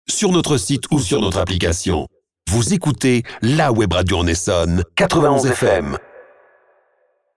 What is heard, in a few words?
Drop Jingle